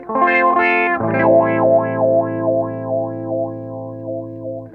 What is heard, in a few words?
WOWOWA, Funk Guitar; Zero G - Funk Guitar